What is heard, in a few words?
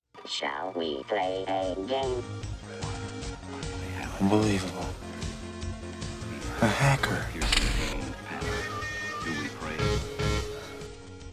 Jingle